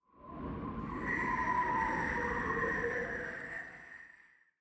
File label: cold wind gust; factorio